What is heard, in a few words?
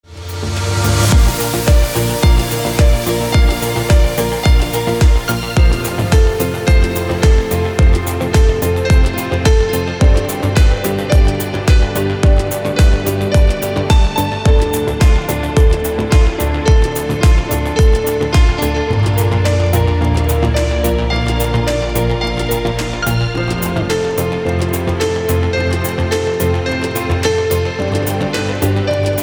chilled lounge mix; Танцевальные